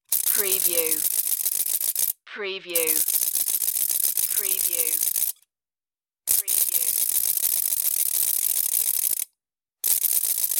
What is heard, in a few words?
cheering toy rattling